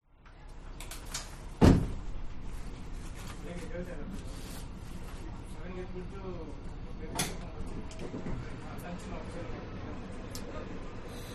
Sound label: train changing locomotive internal voices Germany